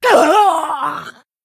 Orc Emote Death

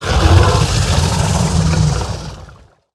Naga Giant Play

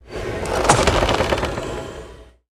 Ancient Totem

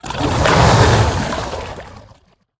Marshspawn Attack

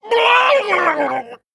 Male Murloc

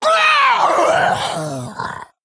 Female Worgen Death

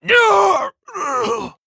Tauren Death

